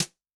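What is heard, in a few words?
Brush Tap, Yamaha DTXpress-II snare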